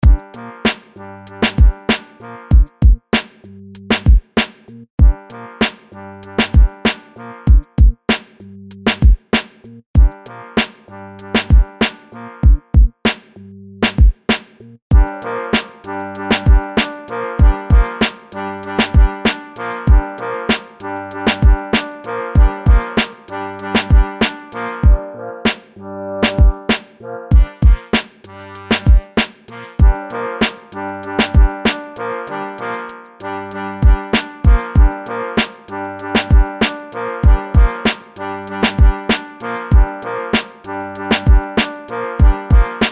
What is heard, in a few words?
Cool Beat